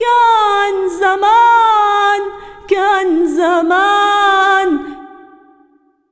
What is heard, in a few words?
SINGER, Arabian Traditions; USB Soundscan vol.43; AKAI